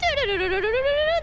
Singing puzzle solved; Zelda

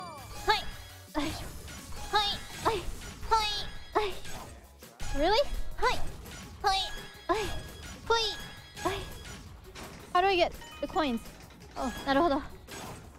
noises

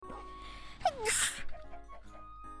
Sneeze

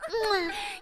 mwah

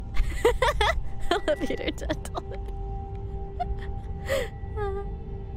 HER LAUGH UHHHOOOOOOOOOOOO

